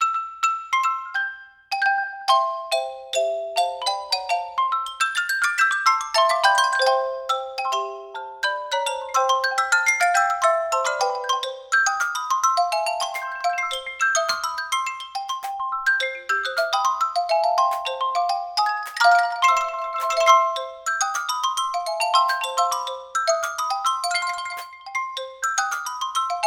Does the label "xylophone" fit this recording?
Yes